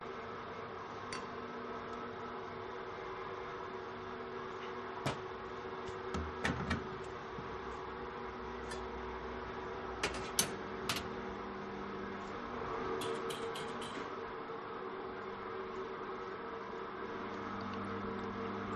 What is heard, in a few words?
bakery food preparation with machine background noise